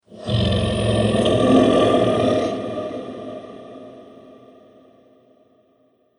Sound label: Dragon Roar Ambience, monster; Monster Sound Effects